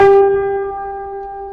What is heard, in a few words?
sol, piano